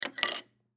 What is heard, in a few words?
Air Hockey Puck Set Down; Games; Sports; Blastwave